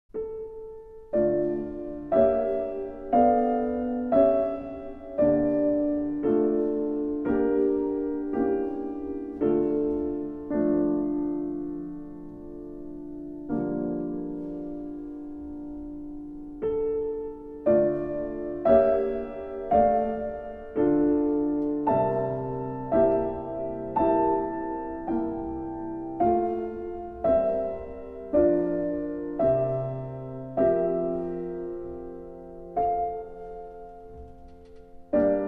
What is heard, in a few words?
Elegia For Piano